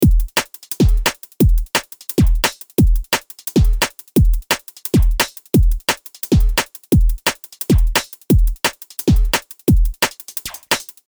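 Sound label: 174 drum loop, Drum & Bass; Tech Flavours; Drum & Bass Sample Pack inspired by the sub-genre of Tech Step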